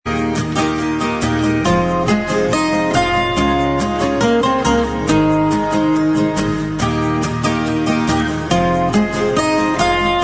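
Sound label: acoustic, instrumental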